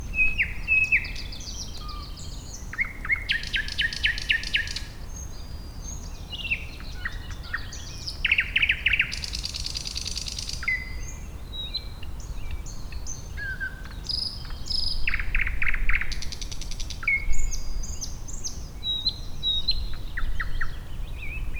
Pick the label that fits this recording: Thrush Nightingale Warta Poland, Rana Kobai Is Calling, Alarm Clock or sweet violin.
Thrush Nightingale Warta Poland